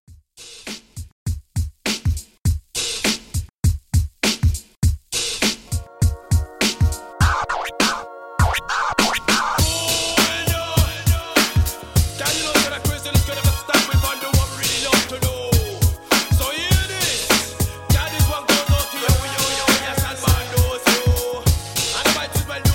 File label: Old School Redrum 101 Bpm